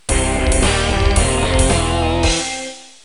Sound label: Air Guitar